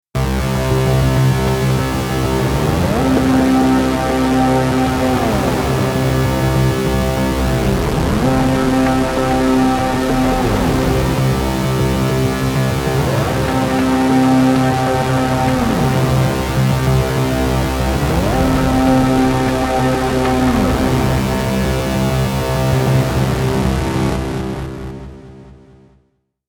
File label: Weird Patch; One patch uses MFX #16 StepFlanger and Pan-Delay global Reverb. The percussive sounds are a side effect of the Step